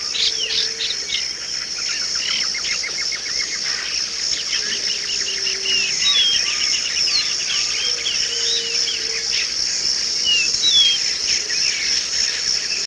jungle ambience

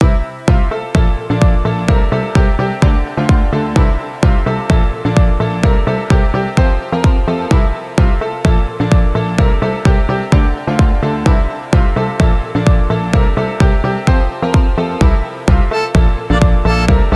melody loop

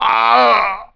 die; unreal; nali

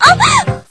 moan

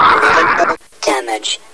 pain, zombie

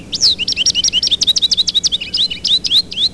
goldfinch

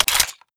ppsh mag out, weapons

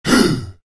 tribal pain

